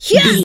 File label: voice female attack